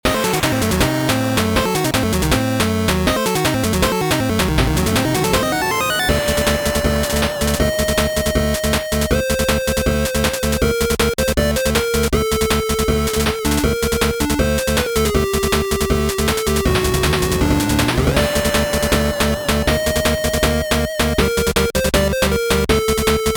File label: Battle Final Boss Chiptune